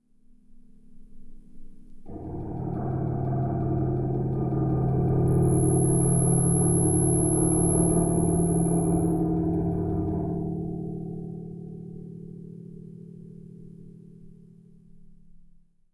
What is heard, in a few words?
FX, EMS VCS3